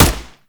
Twin Assault Rifle Fire; weapons; Warframe; Grineer

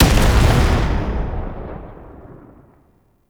Gatling Gun End Stereo; Warframe; Tau Gatling Gun

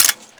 Rifle Mech; Zariman Grn Wraith

